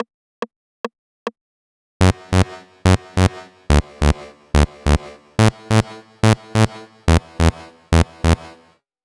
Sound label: Bass Chorus, 142 BPM; Vengeance Samples; Hello You